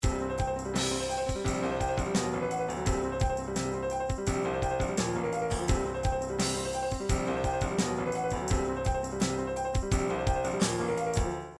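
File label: triphop arpeggio; Newtronic Triphop Construction Kit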